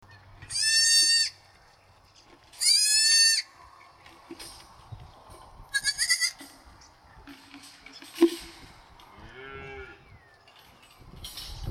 goats

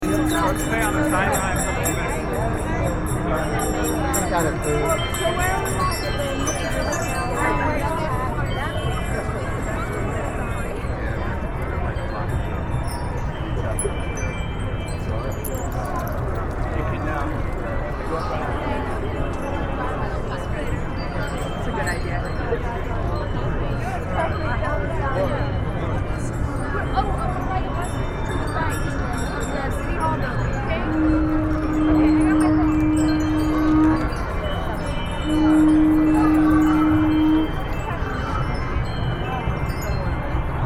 chimes

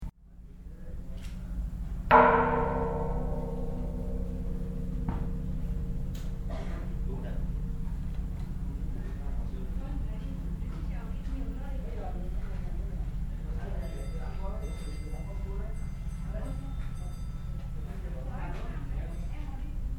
Bell, vietnam

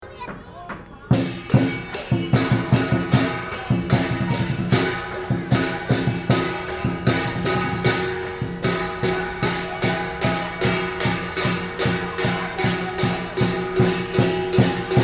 chinese memorial, laos

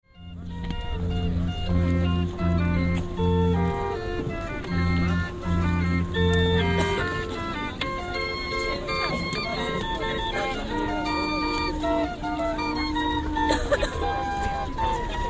Warsaw Crank Organ

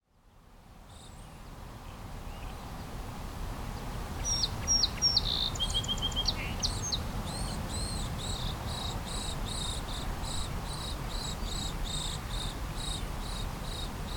Brandon riverbank

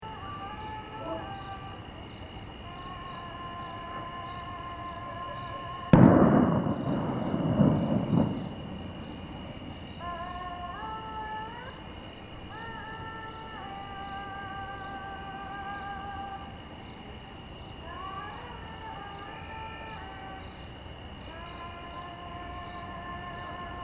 Tepoztlan soundscape

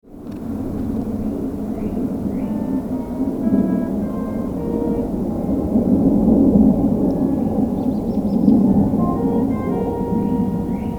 Fairfield evening

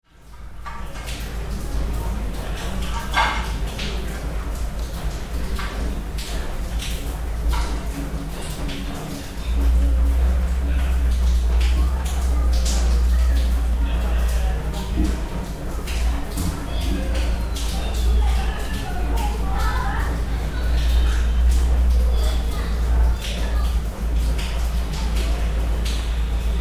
Courtyard, vietnam

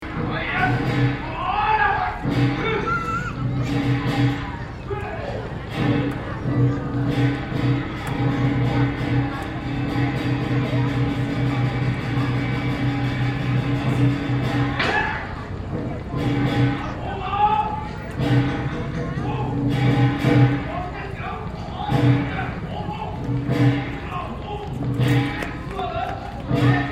chinese opera, laos; Chinese / opera in Ventiane: splendid costumes, lovely makeup, cute actors, / but a terrible sound system

